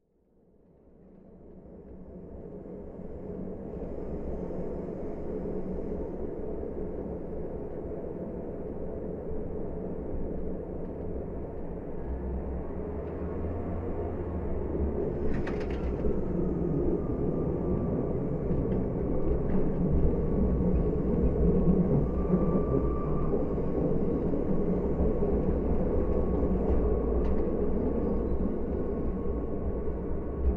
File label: Train (contact microphone)